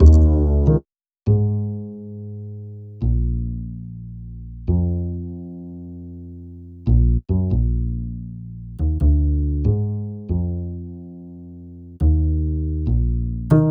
Upright Goodness; Sonic Foundry; Cinematic Funk Urban Tone Poems; Master Zorn

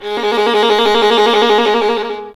STR VLN, Solo Instruments; Miroslav Vitous

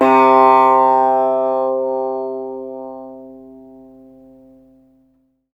SITAR C4; Spectrasonics; Heart of Asia

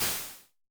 Shakers, Vermona Mono15 Drums

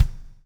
BLUES TK, Backbeat; Spectrasonics - Backbeat

